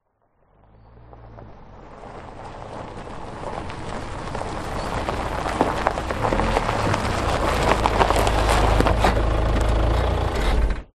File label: transport car suv holden captiva approach slow off gravel track